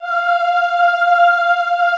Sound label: AHH F4, Extended Classical Choir; Best Service